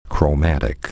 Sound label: chromatic